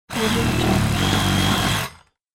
cutting hollow bricks using saber saw; industrial sounds

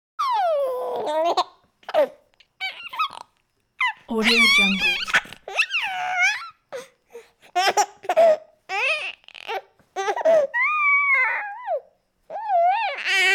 baby laughs and sputters; human sounds; خنده ی بچه

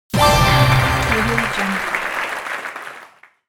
correct answer; پاسخ صحیح و تشویق; game

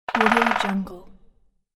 woodpecker bird sound; پرنده دارکوب

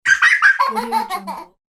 little girl squeal laugh; human sounds

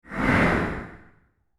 fast whoosh by; transitions and movement

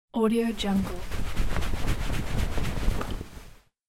drying using towel; domestic sounds

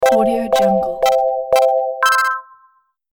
countdown quickly

